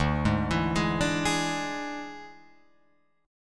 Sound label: D Suspended 2nd Flat 5th D, Guitar, Open F; arpeggiated